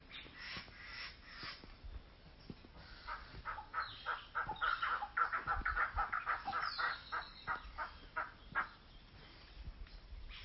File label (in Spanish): chicuaco, aves